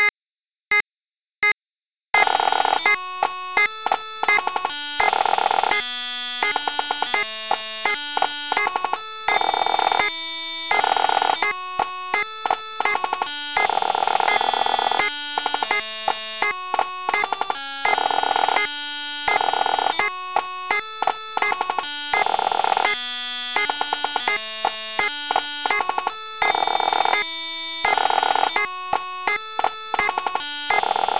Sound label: Pipes Drums